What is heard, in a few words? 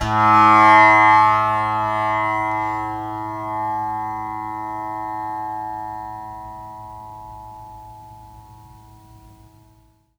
Indian Percussion Tabla; Mango Loops